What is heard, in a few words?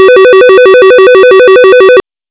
warble low